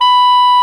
SOP SAX B5; Greytsounds VOL-1

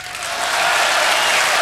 APPLAUSE; Greytsounds VOL-1

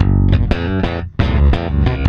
PWR G#, Bass, PWRSTRUM; Spectrasonics; Bass Legends; 128 PWRSTRUM

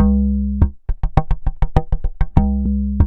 DRUMLOOPS, Korg; Back In Time Records; Korg Universe VOL-1

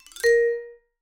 vibe licks; Sampleheads - Dave Samuels Marimba & Vibes

